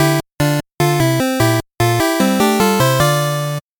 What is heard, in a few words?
8bit jingle slow